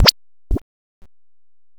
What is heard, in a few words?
teleport; Station-0